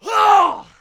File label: Clubber Attack Vocals